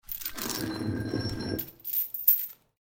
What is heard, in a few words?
Footsteps Chain